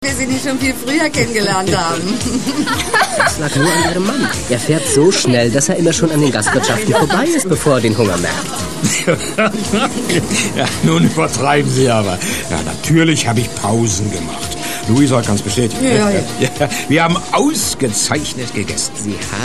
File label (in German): riesenradmusik